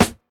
studio snare drum D# key, Royality free snare sound tuned to the D# note. Loudest frequency: 2295Hz; snare drum samples